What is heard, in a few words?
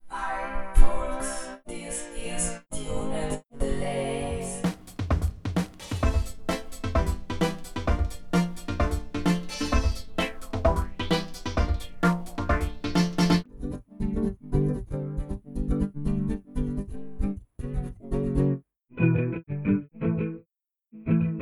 tuned delays